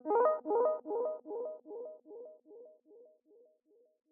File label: Arp Strike - 150 BPM B Min; FX; Athens